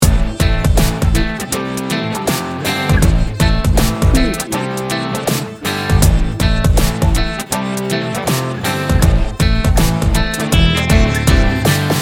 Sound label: sassy blue loop